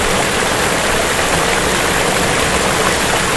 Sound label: waterfall; svencoop; AoMClassic